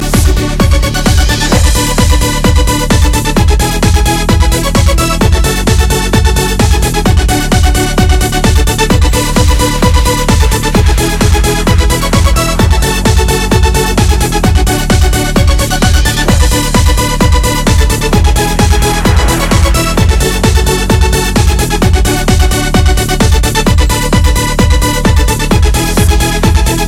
Feel Good Dance

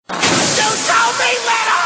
Screams

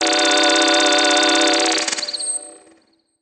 Minimal

